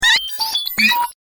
Start-Up Melody Faulty, Robots; Crafted Signals

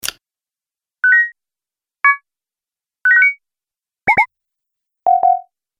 Multimedia Medium Sound; high tech; Multimedia - Medium Sound Pack 01; High Tech Sound Effects

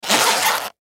Rubber Stretch, Rubber Stress Stretch Rub; Miscellaneous Office (Sound Effects:Offices; Blastwave; Foley

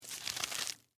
Rope Grip Twist, Handle, Heavy, Rope; Hand Tools; BlastWave

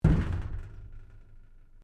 Strike Metal Tank Exterior Rattle Soft

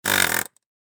Industry Air Hammer Operate Impact Wood Short

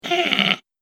Goblin Sinister; Monster Sound Effects; horror

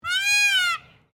Bird Peacock Call; Peacocks in Victoria and South Australia; Birds (Sound Effects:Animals)

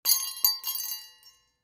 Christmas Bells Single Shake; Foley; Blastwave

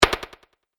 Solid Wood Hit Fast Delay; Impact Sound Effect

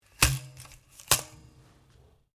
RIFLE REMMINGTON700 7.62MM BIPOD DOWN; A selection of domesttic firearms; Rifles; Guns

